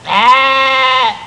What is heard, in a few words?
sheep; Sound Effects for Multimedia - Volume 1; farm animals